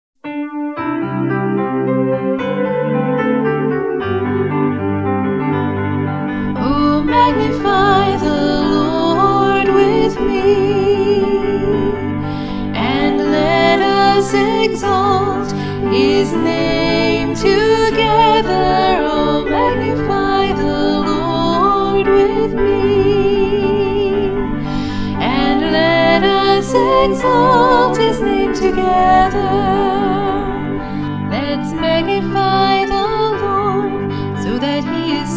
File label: karaoke